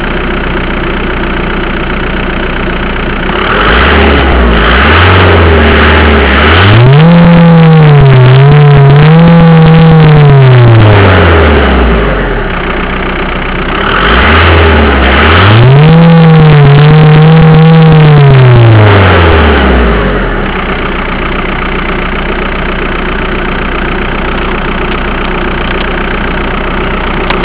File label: Golf IV Sound